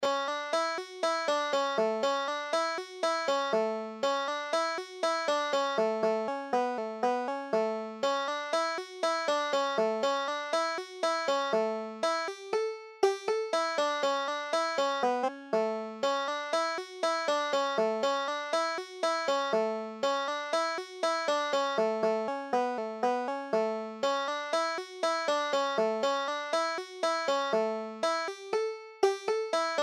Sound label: breaking up christmas, banjo